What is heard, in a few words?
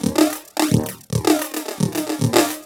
LOOP, TECHNO; TECHNO-BOX; ELEC-LOOPS